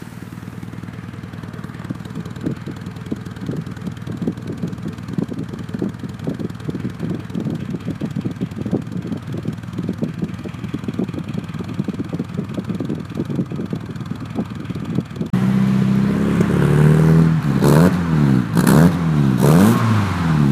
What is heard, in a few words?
Sound bmw 2000 CS, exhausts